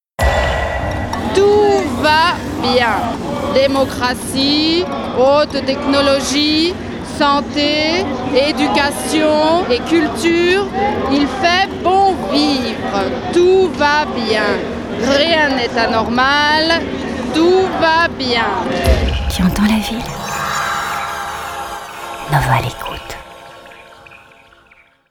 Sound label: Radio Nova Jingle; Nova 25 ans - 2000